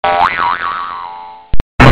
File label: Tooiinnggggggggggggg